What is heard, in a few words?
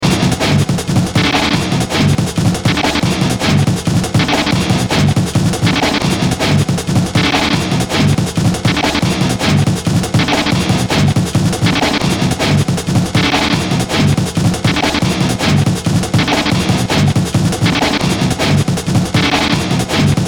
Electro Hard; Beds Loops; RoosterFX